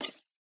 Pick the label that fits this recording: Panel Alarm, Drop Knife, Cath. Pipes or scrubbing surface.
Drop Knife